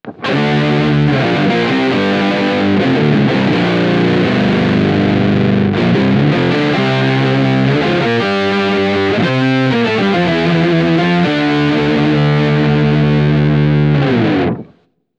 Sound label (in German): Tracking tiefe Oktaven; Walrus Audio Luminary